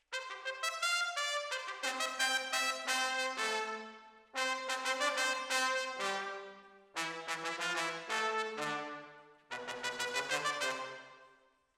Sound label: Decca, Jazz for piano